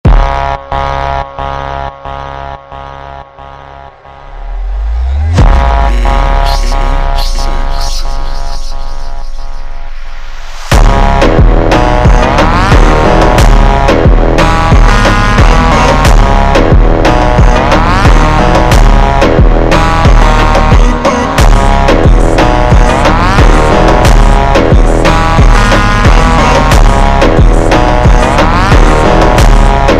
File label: super slowed instrumental